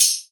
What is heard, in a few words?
hats, Pop; Drums; Slate; SD PASADENA Pop Sample Pack